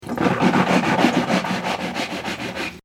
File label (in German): bad mund spuelen